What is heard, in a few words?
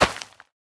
crop, plant; minecraft; item